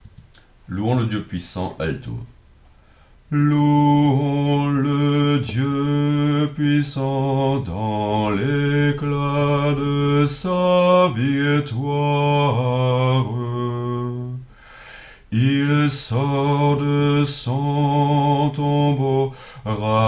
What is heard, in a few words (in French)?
alto; Louons Dieu puissant